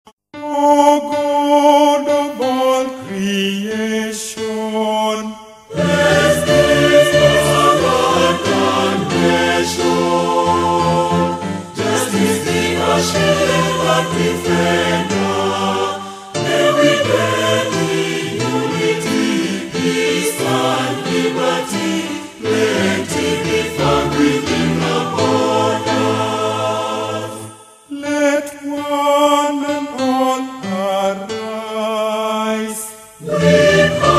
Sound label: National Anthem of Kenya in English O God of all creation